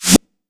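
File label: TR909 Snare Reverse; Roland SH-32; Drumset 03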